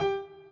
piano intro

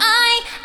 vocal

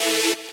saw

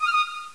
pipe

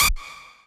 lead

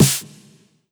snare; osu

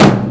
normal hit whistle

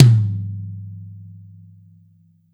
TOM; osu

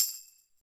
soft hit normal

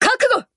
applause

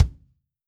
kick

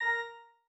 organ

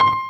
jazz C6 Quarter note

